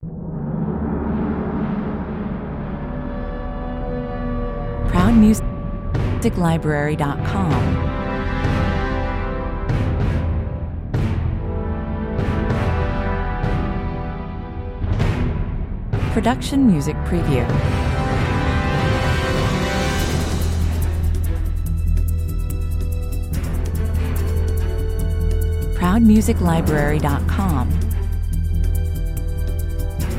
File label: building suspense